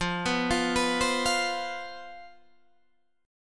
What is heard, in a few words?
C Major 7th Suspended 4th, F, guitar chord